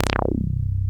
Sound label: MONO POLY, BS